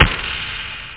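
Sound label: bass drum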